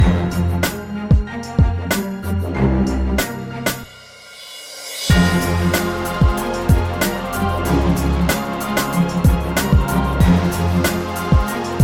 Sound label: Hard Metal Loop